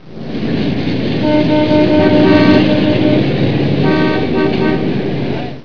Traffic City; iLinux

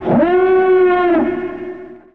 Imperial Alarm Short; Star Wars; iLinux

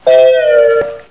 Zag; iLinux

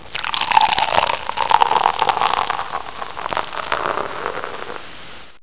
Soda Pour; iLinux

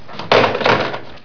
Phone Slam; iLinux

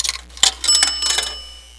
Phone Pay; iLinux

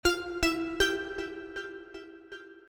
SMS Alert; Daniel Simon; iLinux

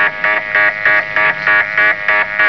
Warning Horn; iLinux

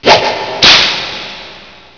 Whip; iLinux

